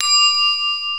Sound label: metal bell; pooh; merry games; carrot